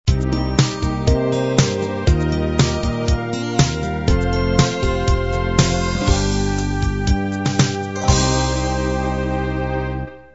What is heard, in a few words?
pop beat end